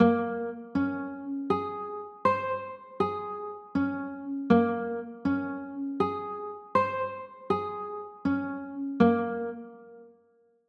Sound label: a sharp m9, chords